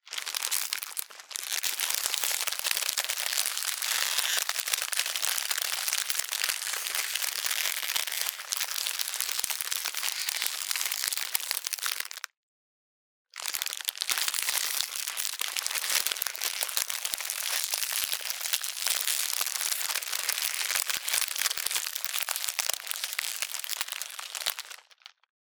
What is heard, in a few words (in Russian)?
treshhit tonkij